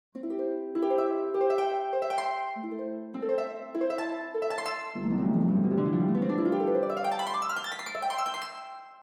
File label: Harp